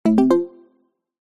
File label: notification digital